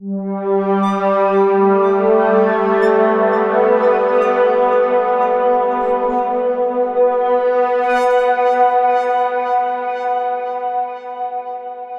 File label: smooth pad processed; kern audio